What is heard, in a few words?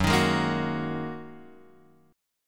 F sharp 6th Add 9th F sharp down Guitar Open F; strummed; JGuitar